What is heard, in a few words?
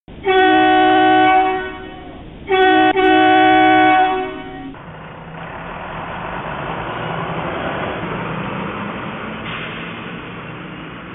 bus